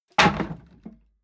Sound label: wood board drop rumble; objekte; holz